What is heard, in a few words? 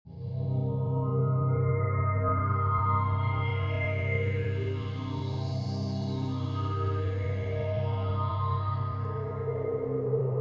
Horror